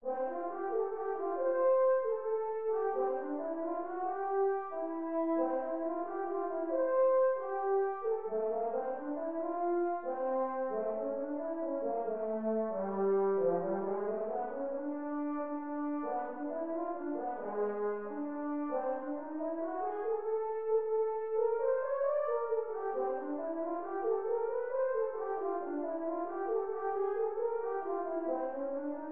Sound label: Horn Excerpt